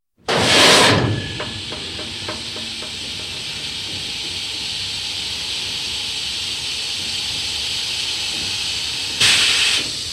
German U-Boat, one torpedo fired (Recorded in torpedo room); Boats; BBC